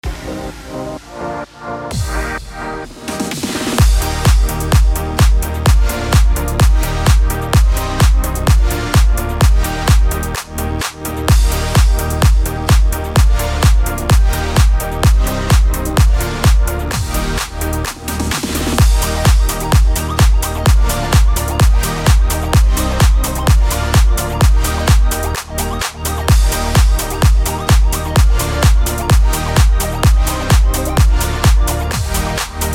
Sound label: DANCE D#MIN 128BPM